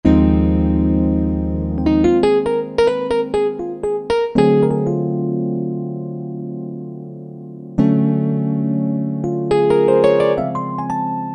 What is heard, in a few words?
E. Piano 1, Roland JV-2080